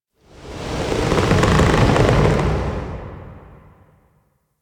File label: Tower Trunk Movement; Warframe; Quests; TheNewWar; Ambience; Cetus; Unum Tower